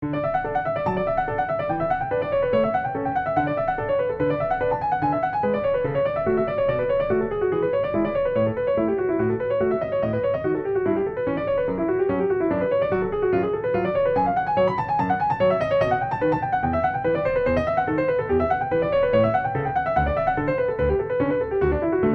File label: prelude d major bach, f grand; uvi